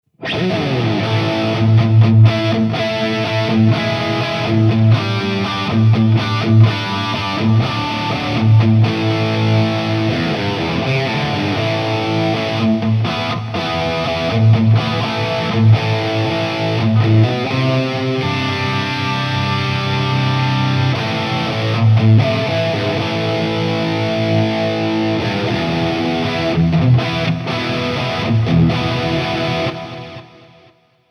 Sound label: Rhythm Wide; ToneMasterPro